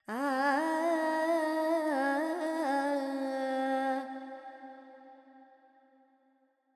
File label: FX, Vocals, Carnatic Female, Alaps, Charukesi